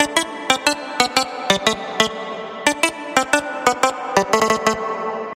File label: trance loop